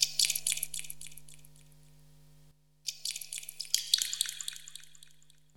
Fountain Splashes; Jungle; Blu Mar Ten - Jungle Jungle - 1989 to 1999 Samplepack

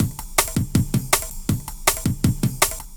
Break, Jungle; Breaks; Blu Mar Ten - Jungle Jungle - 1989 to 1999 Samplepack

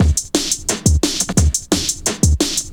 Searchin Break; Jungle; Breaks; Blu Mar Ten - Jungle Jungle - 1989 to 1999 Samplepack

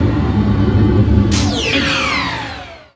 Hit; Blu Mar Ten; Jungle Jungle - 1989 to 1999 Samplepack

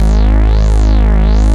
Take You Bass - 1A; Jungle; Blu Mar Ten; Jungle - 1989 to 1999

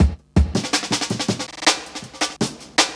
Stepper Break; Jungle; Breaks; Blu Mar Ten - Jungle Jungle - 1989 to 1999 Samplepack

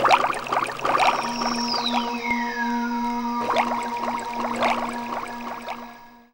Enchanted Water; Jungle; Blu Mar Ten - Jungle Jungle - 1989 to 1999 Samplepack

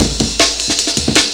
Amen, Jungle Breaks; Blu Mar Ten - Jungle Jungle - 1989 to 1999 Samplepack

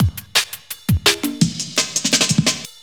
Zone Break; Jungle; Breaks; Blu Mar Ten - Jungle Jungle - 1989 to 1999 Samplepack

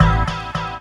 Chord 8A, Riffs, Arps & Hits, Jungle; Blu Mar Ten - Jungle Jungle - 1989 to 1999 Samplepack

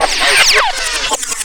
Reverse; Blu Mar Ten; Jungle Jungle - 1989 to 1999 Samplepack

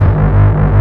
Bass - 1A, Jungle; Blu Mar Ten; Jungle - 1989 to 1999

